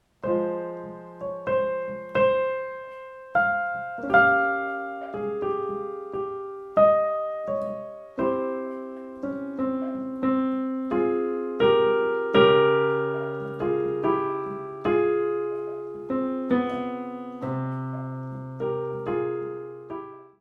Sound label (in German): rhythmus